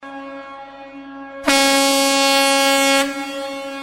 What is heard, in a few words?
ship horn